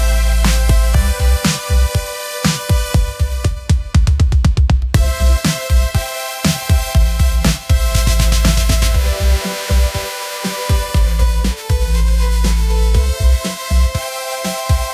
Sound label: classic pop sunshine groove; ai